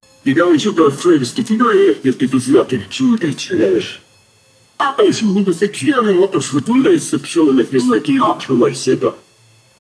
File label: news bed that is up, broadcasting